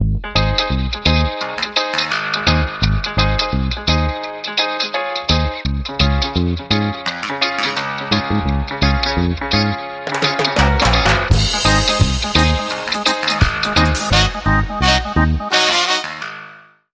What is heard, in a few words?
Reggae Nation